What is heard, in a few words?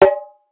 darbuka note